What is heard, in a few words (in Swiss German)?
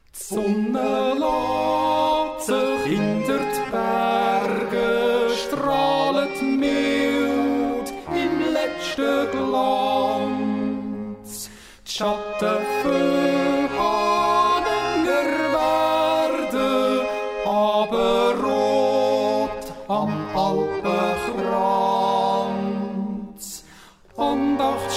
Abestimmig i de Bärge (Duett)